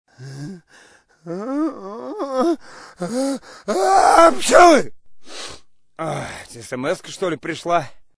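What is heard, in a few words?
sneeze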